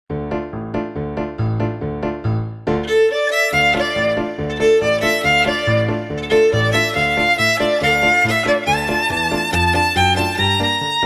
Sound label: viola da gamba